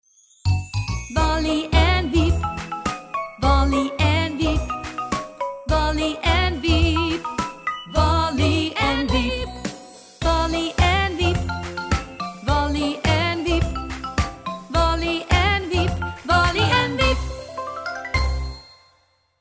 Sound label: Jingle Long Full Vox; Dolfinarium; Wally en Wiep; Dolfinarium Harderwijk; Dreamscore; Wally de Walrus is één van de hoofdkarakters van het Dolfinarium die samen met zijn beste vriendje Wiep de meest onvoorstelbare avonturen beleefd.